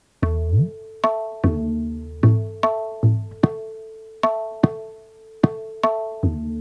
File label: Bhajani, Tabla; Tabla-Transcriber